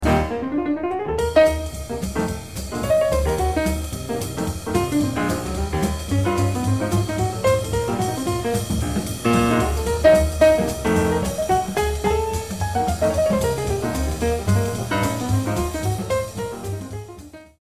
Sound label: Piano Solo